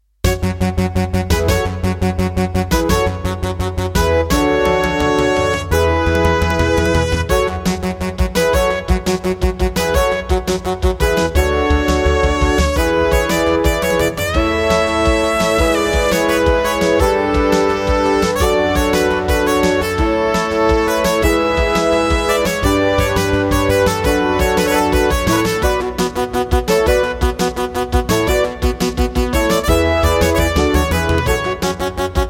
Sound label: Intro, Munt CM32L ROM; 4D Sports Boxing